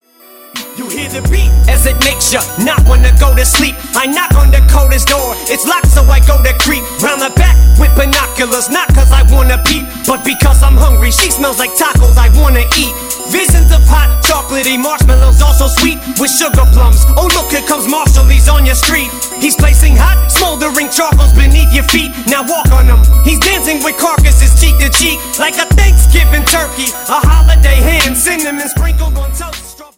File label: Music Box